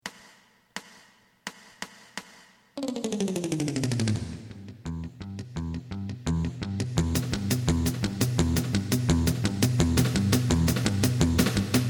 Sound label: lead